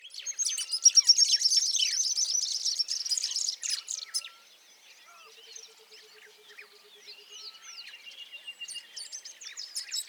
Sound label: Zimbabwe savannah at dawn; Google; Google Sounds; Sound Matters